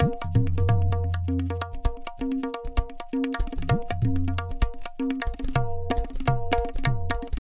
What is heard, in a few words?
Tabla, 130 BPM; Zero-G; Pure Tabla